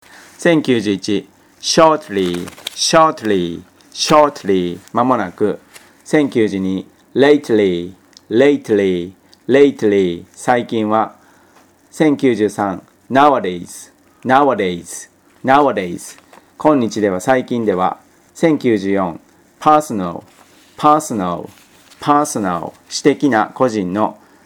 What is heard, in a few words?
tango